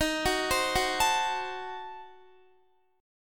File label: D sharp Diminished 7th D sharp Guitar; arpeggiated